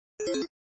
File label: System Confirm Beep; flipper; sttng